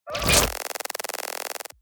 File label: ui glitch granular analog glitch